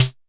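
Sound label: mbrute percussion, Arturia MiniBrute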